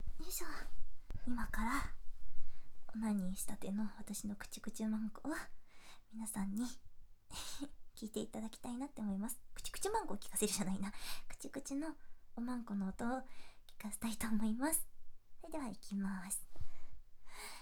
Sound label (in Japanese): くちゅ音 ステレオ